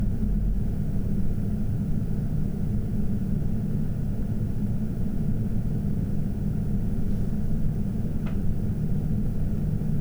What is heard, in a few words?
1000 RPM